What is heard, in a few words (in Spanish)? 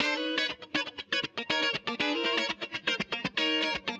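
Guitarra estereo desafinada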